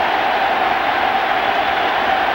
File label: Football Crowd Loop; Crowds